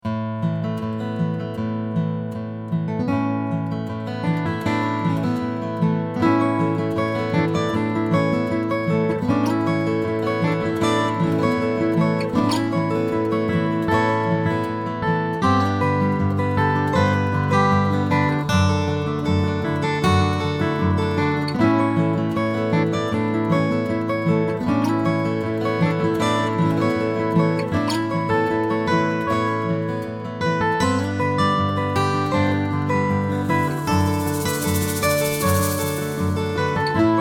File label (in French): Guitares calmes